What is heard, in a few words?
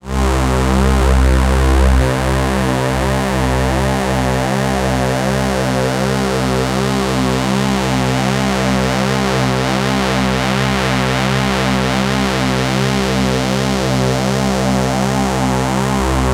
Radikal Technologies EFFEXX RT1701 Saw to Swarm